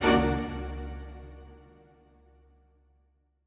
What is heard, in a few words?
Strings Hit Staccato